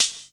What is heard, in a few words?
Clean Hat Sound Sample G# Key, pedal hi-hat